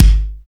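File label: AIRY 909 kick; Drums In Your FACE!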